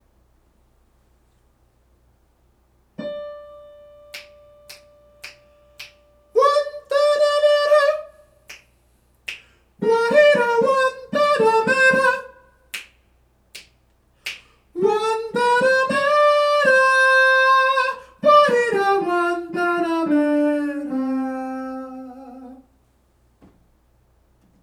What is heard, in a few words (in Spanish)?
tutti soprano